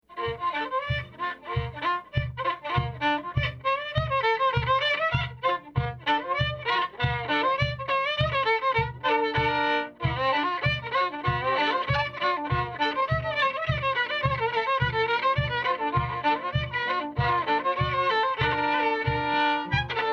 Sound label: A Bb Reel